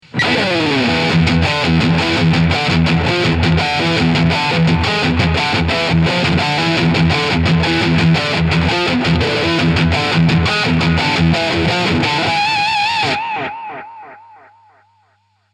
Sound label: Devils Triad High Gain plus Delay; Allpeda